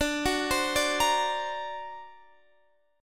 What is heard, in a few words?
D Augmented 7th D down Guitar Standard; D+7 arpeggiated; JGuitar